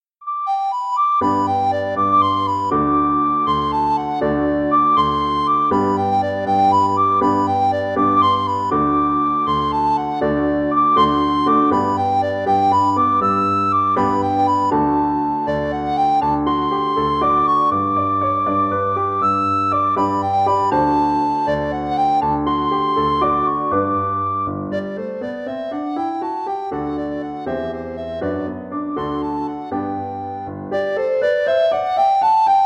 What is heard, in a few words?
recorder